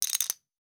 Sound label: Poker Chips; WeeklySFX